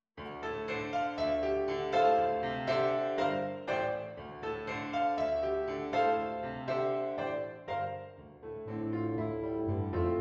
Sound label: Tuba; eb bass or bb bass